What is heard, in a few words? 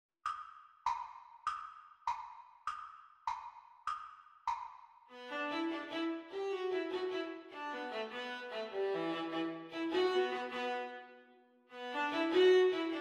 Viola Duet Playalong Exam Speed